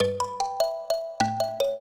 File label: trio, mbira; Mozart